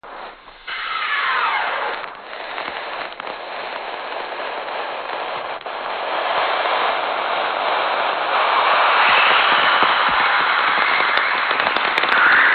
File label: crunch and tremor